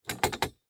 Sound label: Animal Cage Shake, Door; Extermination Sound Effects; BlastWave